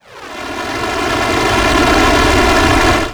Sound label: escape